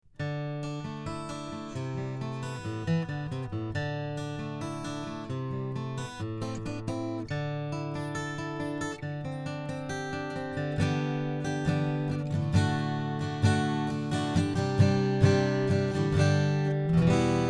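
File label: Stock liquid metal pins, acoustic guitar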